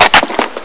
gun pickup

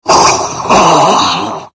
die

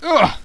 alpha pain; paranoia

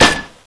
garg claw

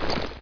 movement

